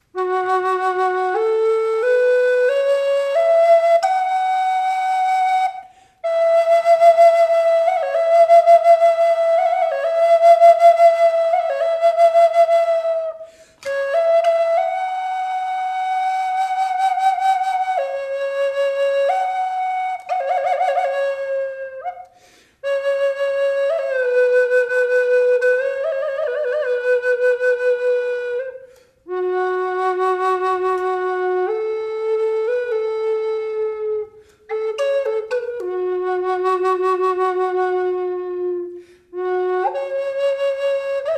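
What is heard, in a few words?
reverb, flute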